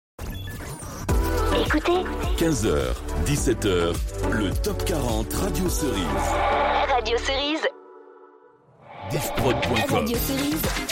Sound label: Radio Sweeps; Radio Cerise